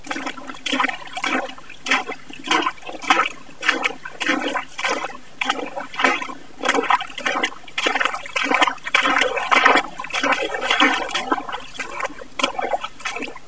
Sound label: water sloshing